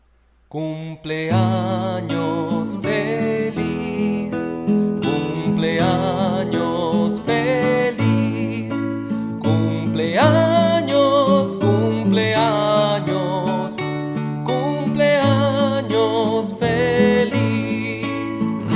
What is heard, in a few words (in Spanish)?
Cumpleanos feliz; Ecole Palmyr Uldéric Cordier